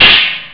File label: hihat; Amiga Soundtracker Sample Packs